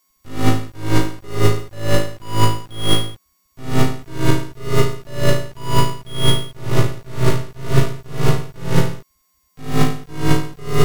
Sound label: sweep